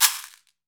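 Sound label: Latin Shakers, caxixi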